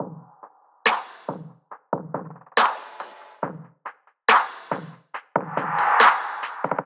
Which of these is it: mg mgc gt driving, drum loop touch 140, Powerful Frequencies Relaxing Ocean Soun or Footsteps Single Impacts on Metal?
drum loop touch 140